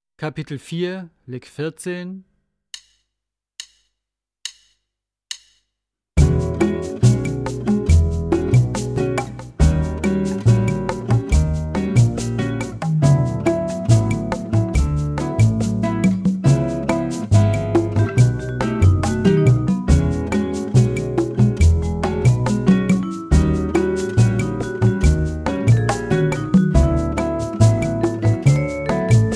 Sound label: Latin Style Guitar